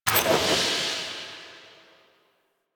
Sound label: Crafting UI Open; Zariman